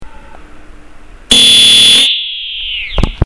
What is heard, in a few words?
electrical horn, air horns